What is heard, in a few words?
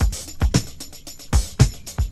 Drum loops, Funky